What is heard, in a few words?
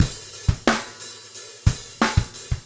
Rock, Drum Loops